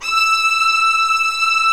STR, Vlas Sect, Agitato

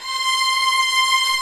ARCO VIOLAS; OMI - Universe of Sounds

